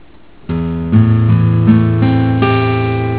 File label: chd am7, guitar